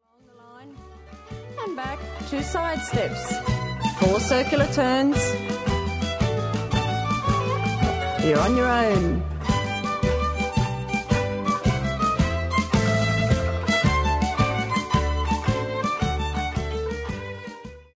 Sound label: Boston Two Step